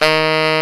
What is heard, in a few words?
D#2, TENOR SAX; AKAI S-Series CD-ROM Sound Library VOL-7